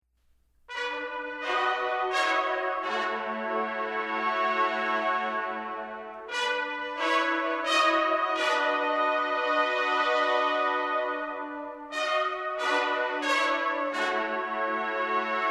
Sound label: chimes; buckeye; carmen